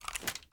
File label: fast reload end; gmod